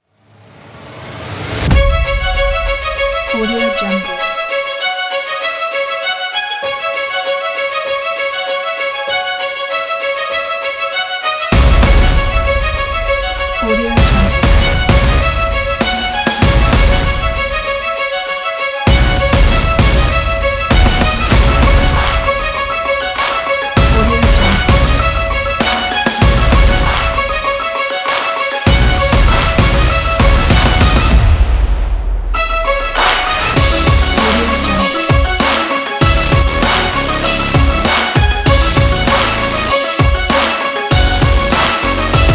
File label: dramatic sport rock kit